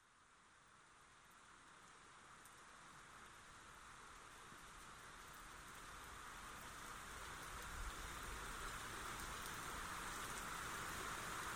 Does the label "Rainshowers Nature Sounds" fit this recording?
Yes